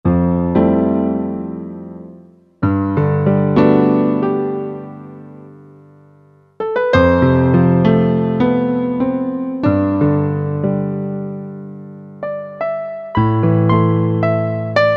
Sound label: Piano Soft, Korg MSC-8S Percussion